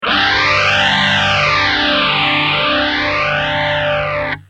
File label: gtr